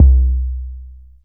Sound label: kickdrum